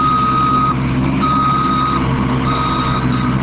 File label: backup beep loader